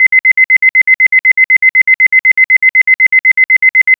short sound effect timer

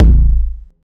ball kick

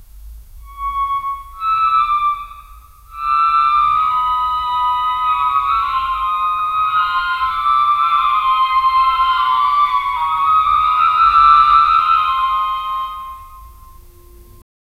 calliope playing a fast, circus

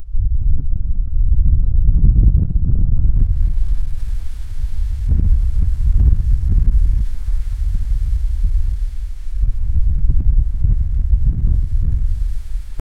cold winds howling ice

